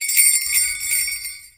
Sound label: jingle bells